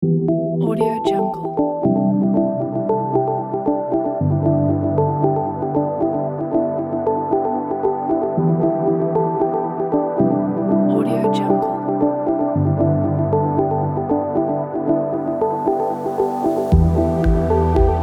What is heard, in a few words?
chilled ambience background